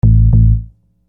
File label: Groove Bass, Hip-Hop Street Beats